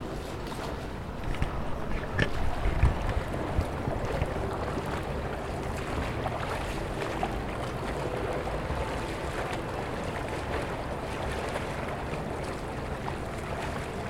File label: pool; Pendleton